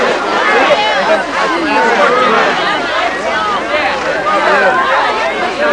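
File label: background music; Virtual Graceland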